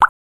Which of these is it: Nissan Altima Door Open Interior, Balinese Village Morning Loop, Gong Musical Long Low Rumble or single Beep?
single Beep